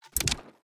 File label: submenu dig open